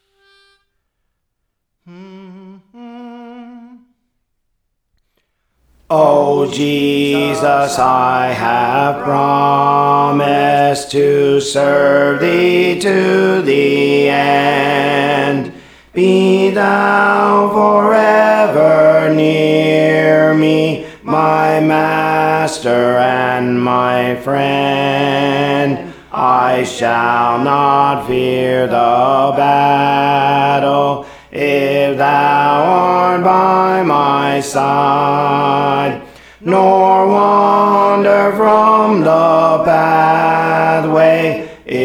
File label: Alto, Songs; Praise For The Lord Training